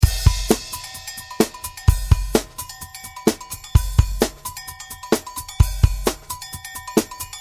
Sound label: hip loop, DrumLoops; Hip-hop 2